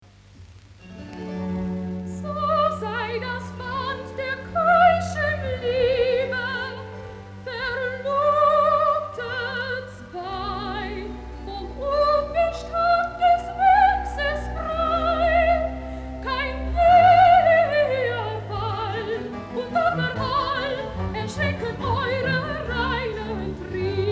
Recitativo

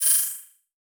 Multimedia Internet CD-Rom Flash Beep Mechanical

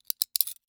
Foley Toy Handcuffs Latching And Opening

